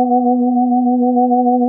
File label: KORG B3, Rare Synthesizer; Hollywood Edge; Giorgio Moroder Rare Synthesizer Collection